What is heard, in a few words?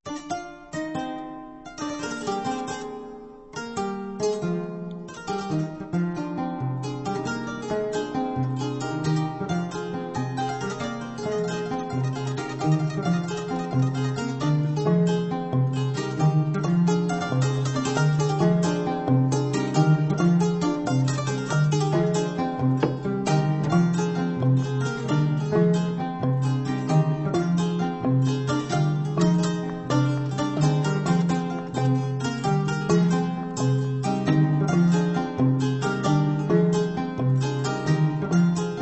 Songs Griot; World Sounds